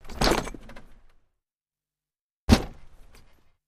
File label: Carriage Door, carriages